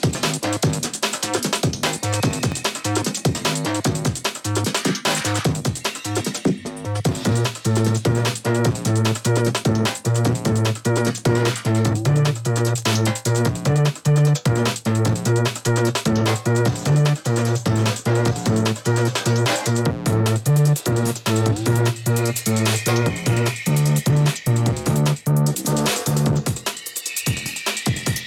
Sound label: 808 Instrumental